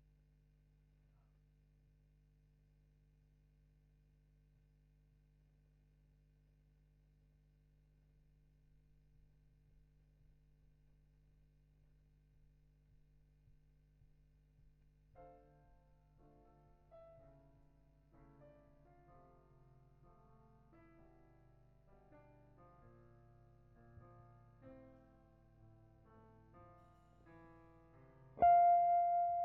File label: GTR B